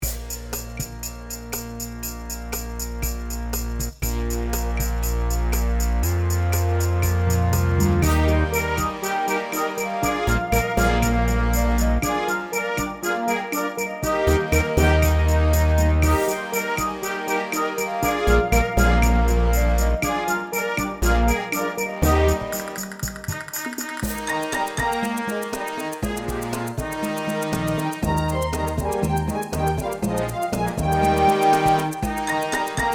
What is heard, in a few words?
Brass Bb Backing